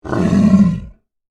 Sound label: Monster Grunt, Horror, Monster, Grunt, Creature